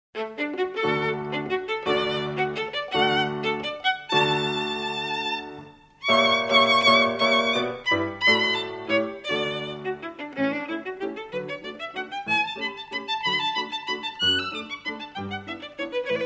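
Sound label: Country Dance, violin; Suzuki Violin Method; C.M. von Weber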